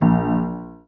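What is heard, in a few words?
piano b octave; You Are Empty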